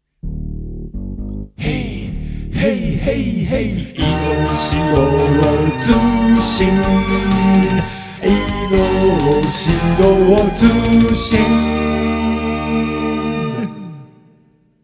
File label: News Jingle; Shiro Ino News Jingle 2.5 -2024 Mix- (Mono)